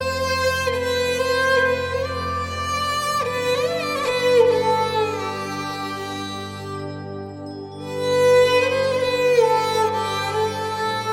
rajasthani folk fusion

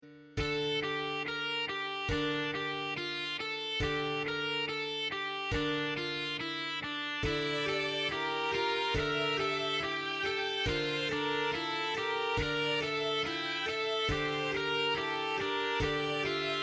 electric guitar

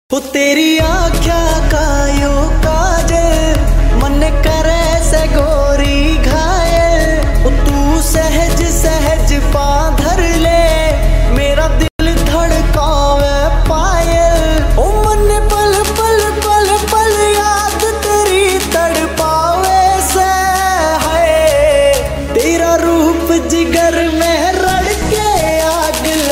song ringtone

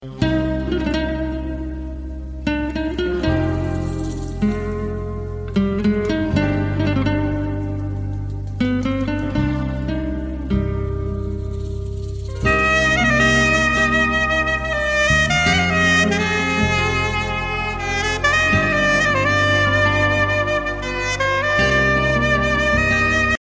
sad romance ringtone

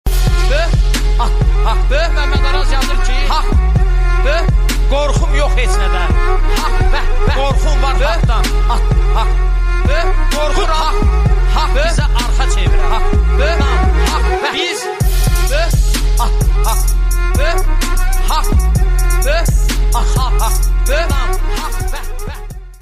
trap

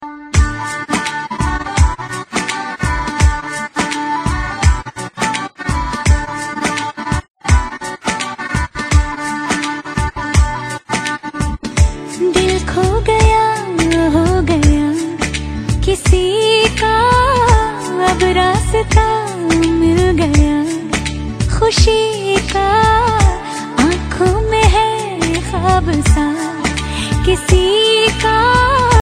female ringtone

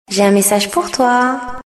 sms tune in french, ringtone

